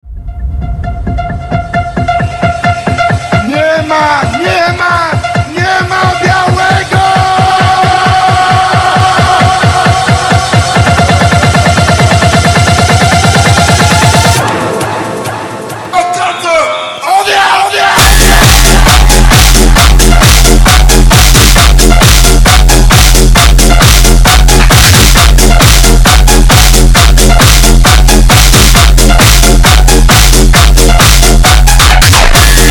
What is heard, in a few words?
Phonk Slowed